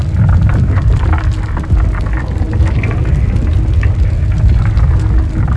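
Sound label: dire ancient loop, ambient soundscapes; dota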